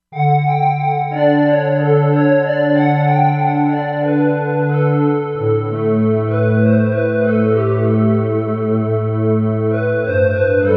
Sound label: SquareSyn, Korg MSC-07S Synth